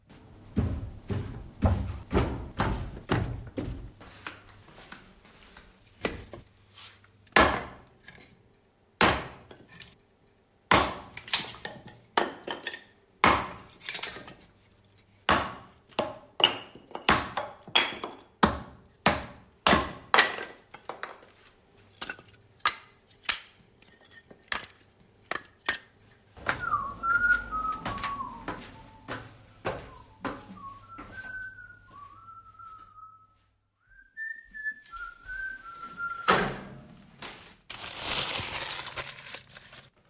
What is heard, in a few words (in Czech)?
ruchy